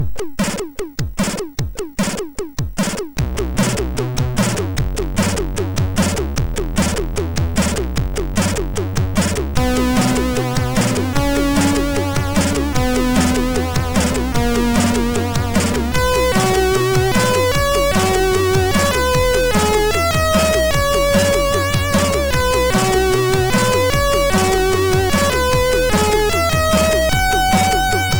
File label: RTI Intro, MOS6581R2